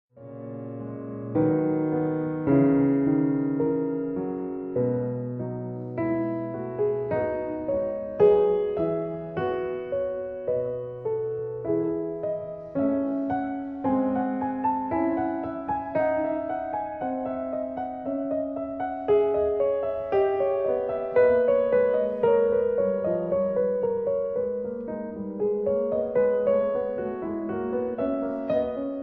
Clavier C-sharp minor Fugue; The Well-Tempered Clavier

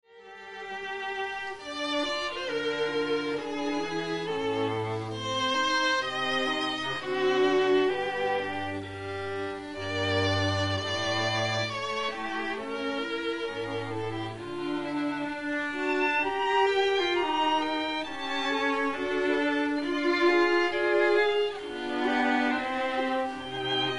Prelude in F minor

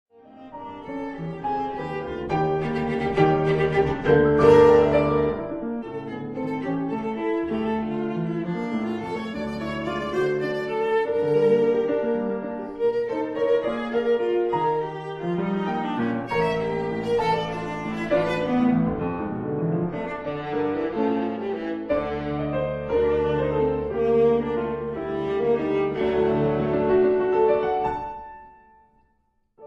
Phantasiestucke For Viola Piano Leicht bewegt; Fuchs; Op 117

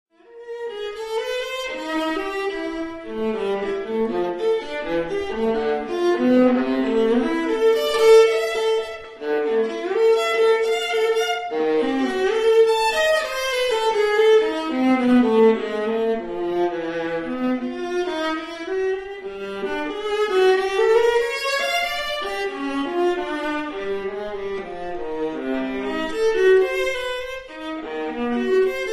Suite For Viola Solo; Bloch

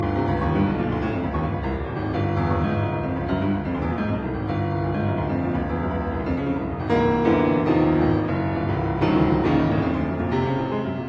Fugue in C sharp major; 6 Preludes and Fugues; Fugue No 2

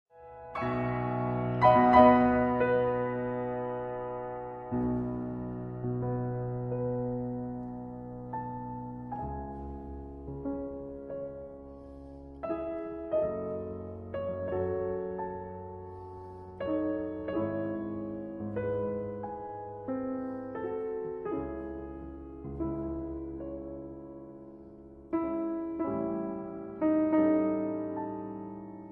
Piano Sonata in B flat major Andante sostenuto

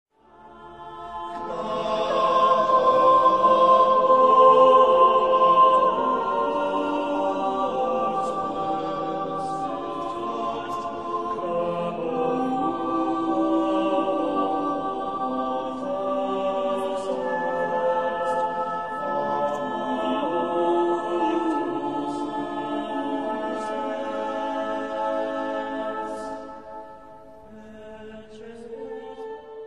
motet for 4 voices; Tallis; Audivi vocem; P. 90

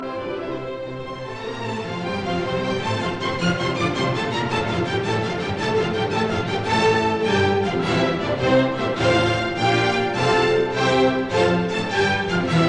Symphony in F major Pastoral Allegro; No 6; Op 68; Merry Gathering of Country Folk; Bruno Walter

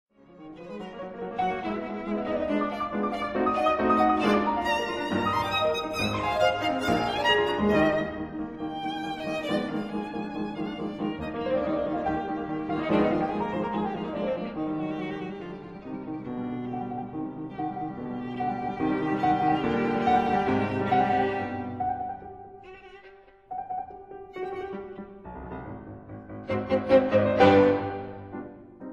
Sonata for violin & piano in C minor, Finale; No. 7; Eroica; Op. 30; Allegro

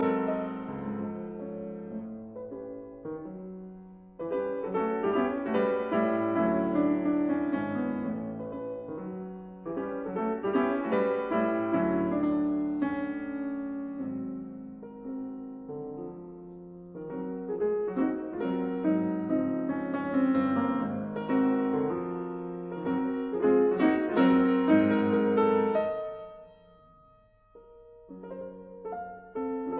Mazurkas in D flat major Allegro; 2 Mazurkas Op 40 No 1

